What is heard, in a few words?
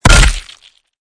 death; joker